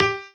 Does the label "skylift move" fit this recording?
No